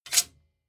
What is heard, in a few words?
sword scrape fast